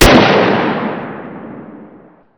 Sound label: kar98; WAR